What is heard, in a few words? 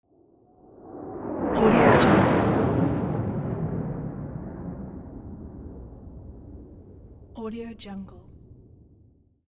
airplane